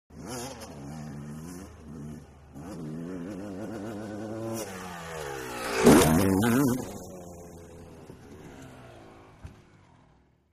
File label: Motorcross Jumps By, motocross